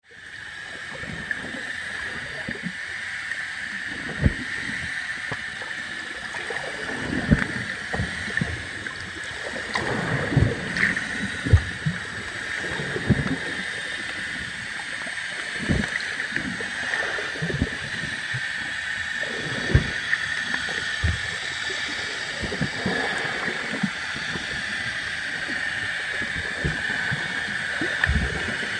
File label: underwater at Little Tribune Bay